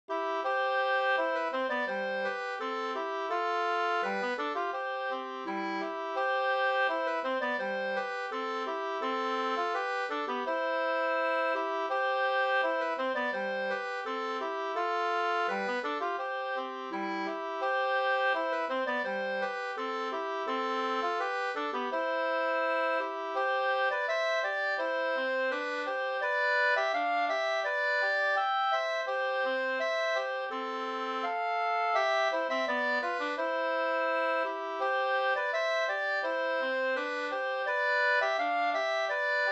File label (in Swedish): brudmarsch Ridmarsch C dur